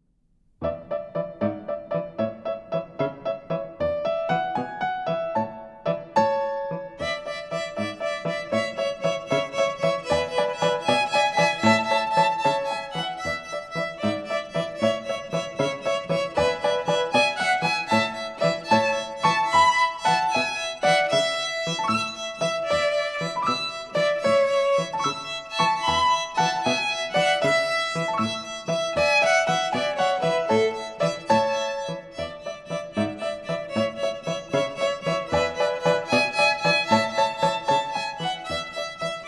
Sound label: slow tempo